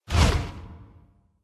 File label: Frog Shot